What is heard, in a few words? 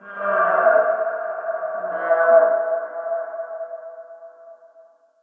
Signature Call; Ambience; Corrupted Ode Three